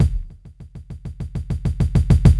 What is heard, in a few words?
drbreak, drumbreaks; Masterbits; Vinyl Attack